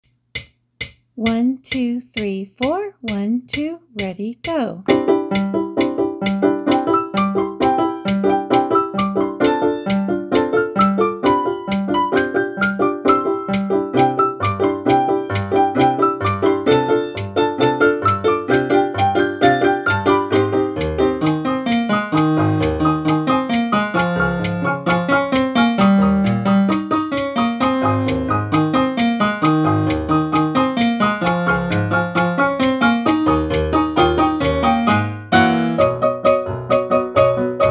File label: Duet Fantasy Jingle Bells